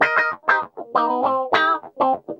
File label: GUI, LICKS